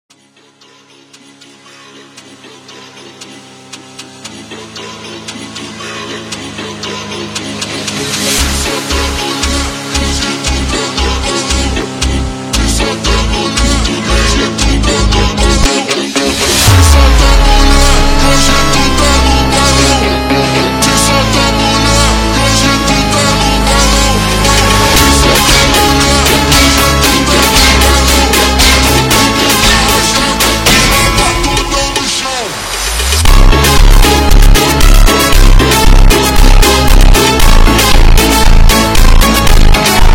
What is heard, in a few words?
Funk, slowed